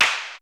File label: rim, Roland MC-909